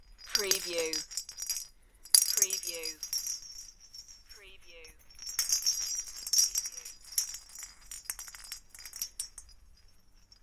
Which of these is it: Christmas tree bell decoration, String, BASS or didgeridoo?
Christmas tree bell decoration